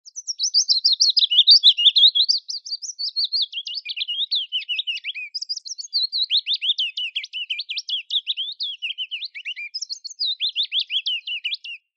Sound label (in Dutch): Fitis; Kroondomein